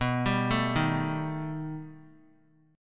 B Augmented 7th B down; arpeggiated; JGuitar

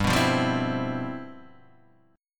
G 13th G, chord

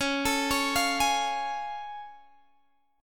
A Augmented Major 7th Db

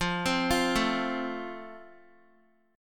F Major, Guitar; F arpeggiated; x,8,10,10,9,x

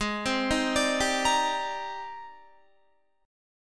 G sharp Major Sharp 11th, Guitar; G#M#11; arpeggiated

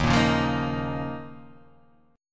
D sharp 7th Suspended 2nd

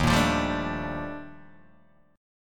Eb Major Sharp 11th Eb Guitar DADGAD

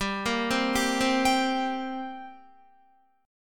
G-Minor Double Flat 5th G down Guitar; arpeggiated; JGuitar

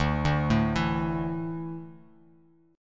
D Suspended 2nd D down Bass Standard; arpeggiated

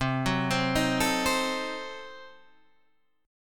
C Diminished 7th down Guitar Half Step Down

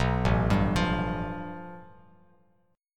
A 7th Suspended 2nd Sharp 5th, Bass Standard; A7sus2#5 arpeggiated; JGuitar

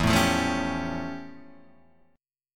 F Augmented Major 9th E

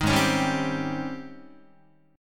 Ab Major 11th Ab Guitar; AbM11 strummed; 6,4,4,5,4,6

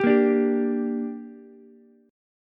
D Suspended 4th Sharp 5th D down Ukulele; strummed

